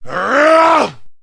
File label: man grunt; Mount&Blade Warband